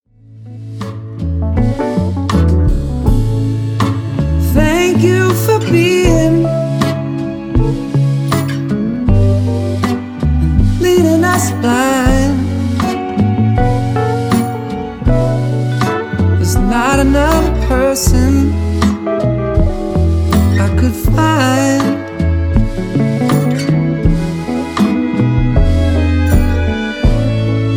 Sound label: cry